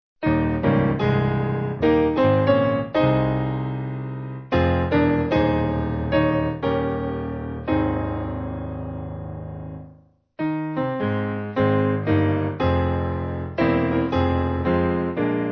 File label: Piano, National Anthem